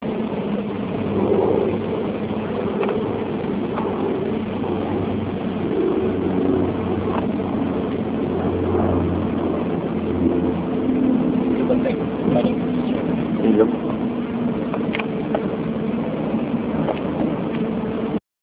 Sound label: string bite